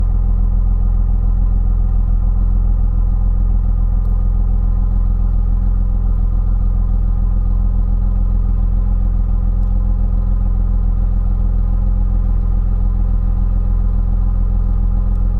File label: BMW E46, Cars, Vehicles, Engine, Idle, Loop, Exhaust; NTG4; Andrew Vailcross; BMW 3 Series